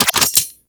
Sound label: Scoop Equip; Warframe; Missions; Tenno Ball